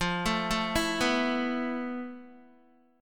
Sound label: F Major 7th Flat 5th Guitar; arpeggiated; JGuitar